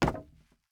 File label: wood land